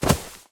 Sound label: grass land; firefight